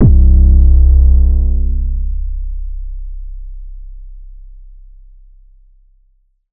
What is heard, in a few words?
808 oneshot Blam C; Slate Digital BASSHOLE Sample Pack